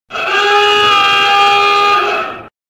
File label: klaxon alarm